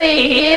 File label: Eeeeeeyih; Bip Boing & autres